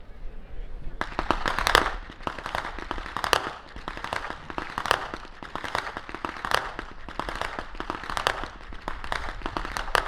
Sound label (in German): schnalzen; SAALDORF V; saaldorf surheim freilassing